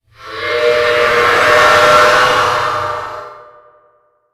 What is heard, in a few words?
Monstrous Cow